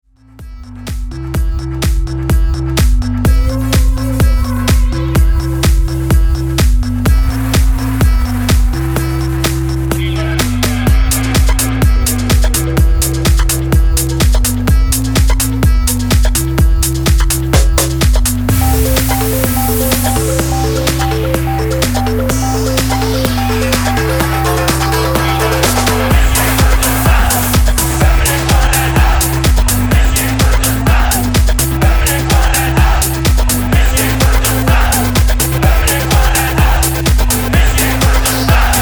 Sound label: progressive house mix